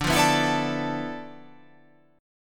D Minor 6th Add 9th D

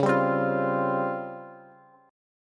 Db Major 7th Flat 5th, Bass; DbM7b5 strummed; JGuitar

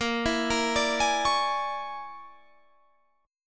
Bb 7th Sharp 9th Flat 5th Bb down Guitar Drop D

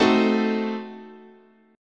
A Diminished 7th, chord, guitar

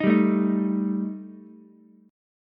Eb Major Flat 5th Eb Ukulele; EbMb5 strummed; 8,7,5,6; JGuitar

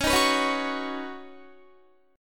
Db 7th Suspended 2nd Suspended 4th, Guitar; 21,18,21,18,18,20; Db7sus2sus4 strummed

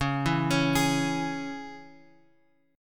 C sharp Minor; C#m Chord {X 4 2 X 2 4} arpeggiated

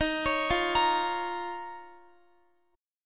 D sharp 7th Suspended 2nd D sharp, Guitar; D#7sus2; arpeggiated; 4F,4A,5C,5G,5C,6E; chord shape; x,x,15,18,17,18

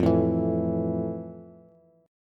F Major 7th F, Bass; strummed; JGuitar

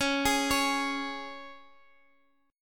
C sharp 5th; arpeggiated; C#5; JGuitar

